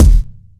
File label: loud kickdrum d sharp key, Loudest frequency: 454Hz